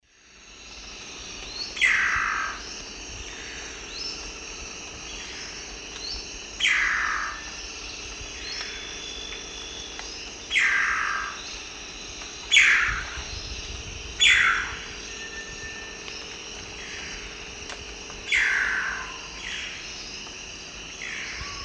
Frederickena unduligera, calls separated pair; S. America; Eastern Lowlands

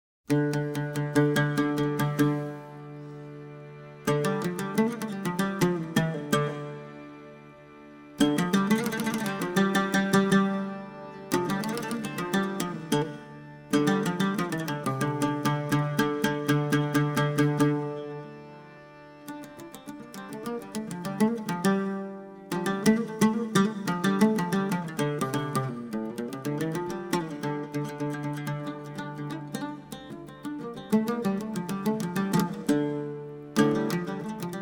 Desert Blues World

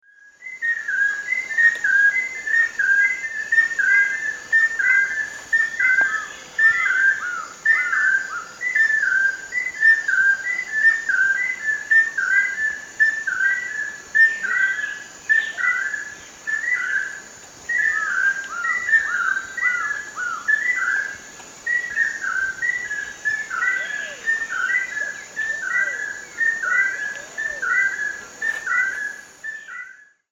Anurolimnas castaneiceps, duet; S. America; Eastern Lowlands

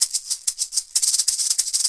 Perc, Percussion Latin

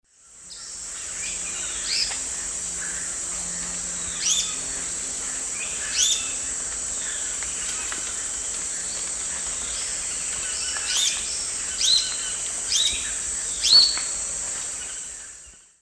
Tolmomyias traylori, song; S. America; Eastern Lowlands

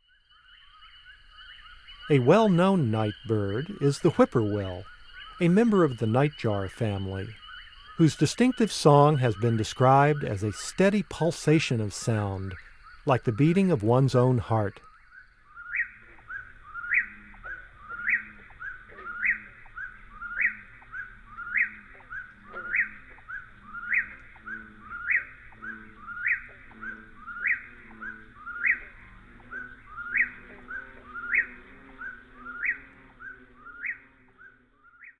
Whip-poor-will; North America; Common Western Species; Know Your Bird Sounds

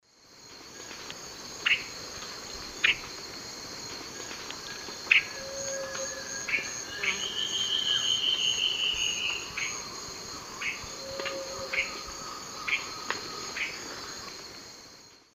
Myrmoborus myotherinus, chrk call and song; S. America; Eastern Lowlands

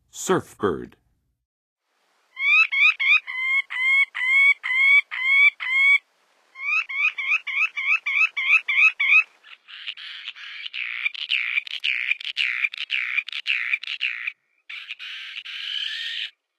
Surfbird, Birds of North America; Western USA; Western USA Songbirds

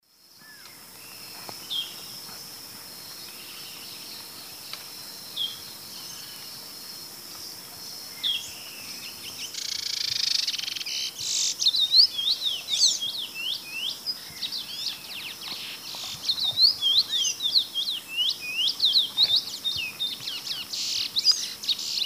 Oryzoborus angolensis, calls and song; S. America; Eastern Lowlands

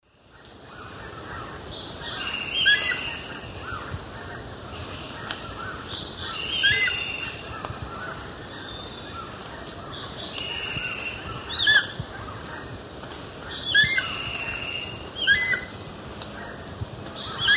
Spizaetus tyrannus, calls subadult; S. America; Eastern Lowlands

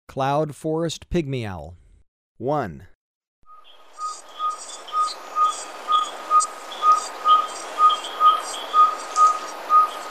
Cloud-forest Pygmy-Owl, Birds of Ecuador; S. America

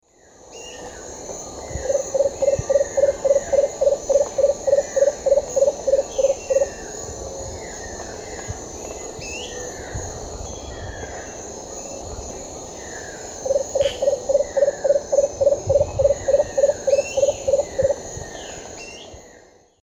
Eubucco richardsoni, song; S. America; Eastern Lowlands

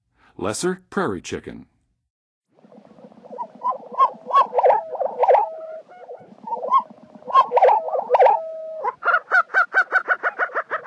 Lesser Prairie-chicken, Birds of North America; Western USA; Western USA Songbirds

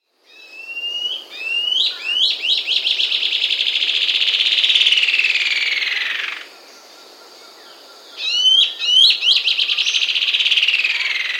Bluish-Slate Antshrike; S. America

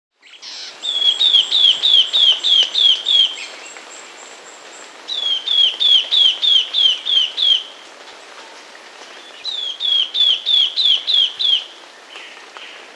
Ashy Antwren (Myrmotherula Grisea), Andean Birds; S. America

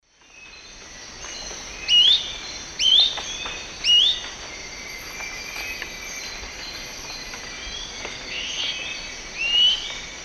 Tolmomyias poliocephalus, song; S. America; Eastern Lowlands

